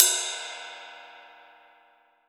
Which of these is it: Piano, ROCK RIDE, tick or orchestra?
ROCK RIDE